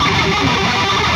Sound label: head bangin